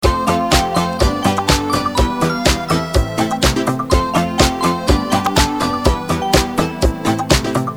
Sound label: Loop